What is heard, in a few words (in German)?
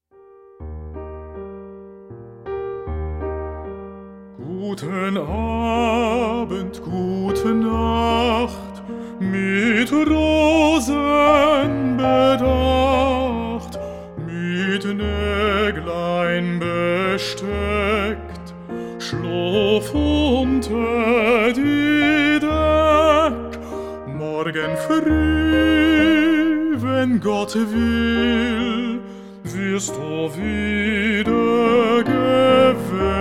wiegenlied